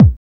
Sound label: 909 HARD K; Drums In Your FACE!